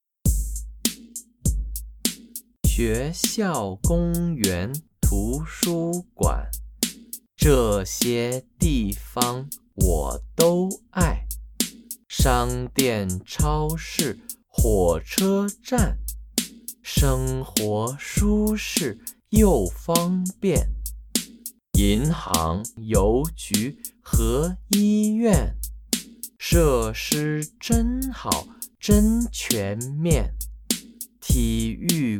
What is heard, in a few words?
Chant